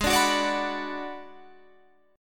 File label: G sharp Minor 7th Flat 5th G sharp down Guitar Half Step Down